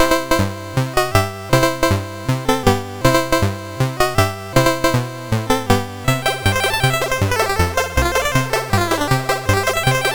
Paddle Mania, CSG8580R5

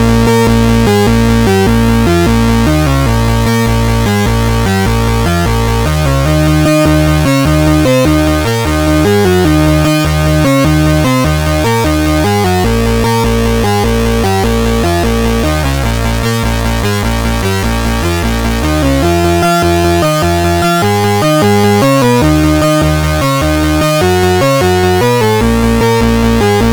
Introtune, MOS6581R4; hvsc

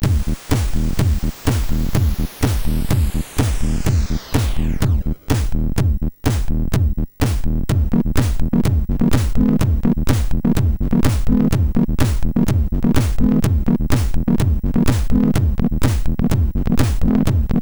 sid, MOS6581; hvsc

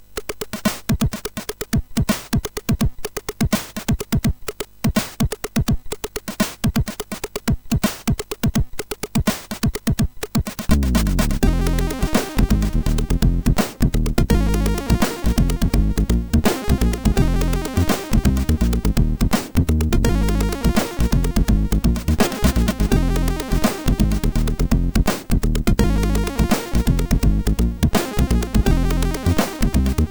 Note jingle, MOS6581R4

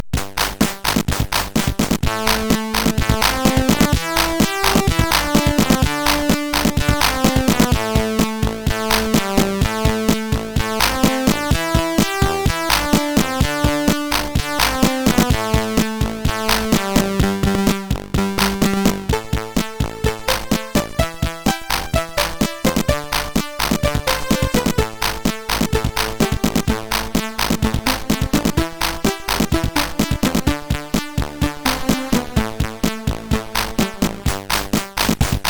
intro, sid, MOS6581R2

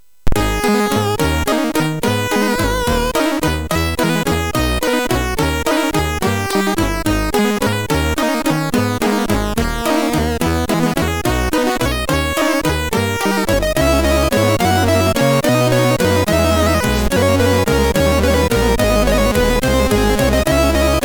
Classical, MOS6581; MOS6581R3

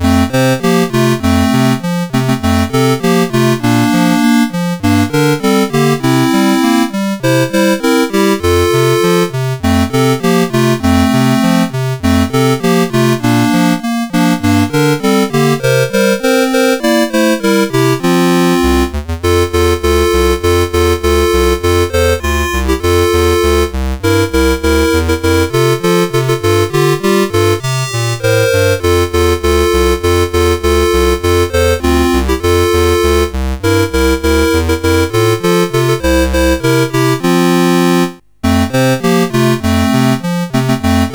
Jingle Bells, CSG8580R5

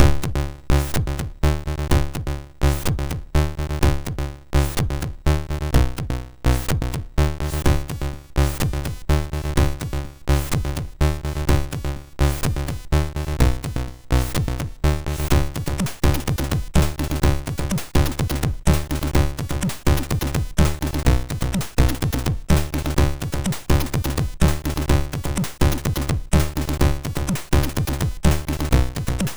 Noise, MOS6581R2

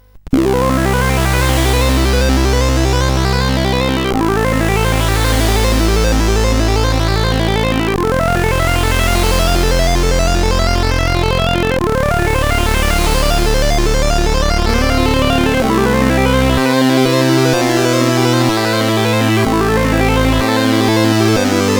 Sad End, sid CSG8580R5

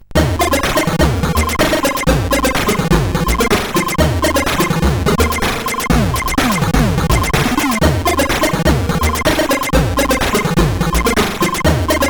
Crazy Music, sid CSG8580R5

